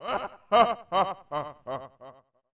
Evil Laugh; George Foreman's KO Boxing; SNES

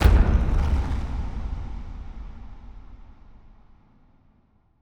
Stingers sting monster

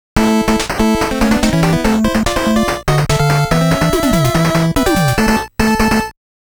Game Start

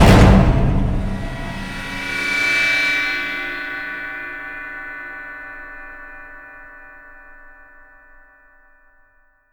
Fail

